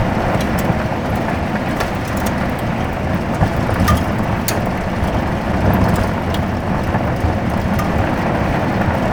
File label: surface tire dirt loop, vehicles; Call of Duty Modern Warfare